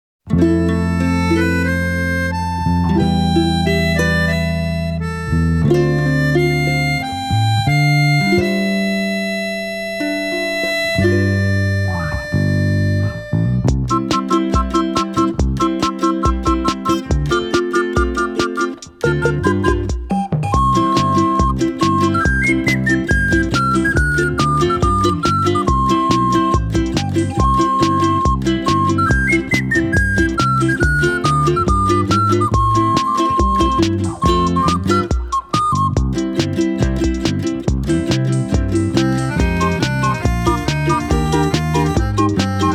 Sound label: Stage Medley (Acoustic Version); superstarultra